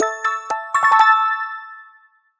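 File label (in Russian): включение работающего пейджера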